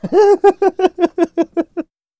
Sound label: Soldier Laugh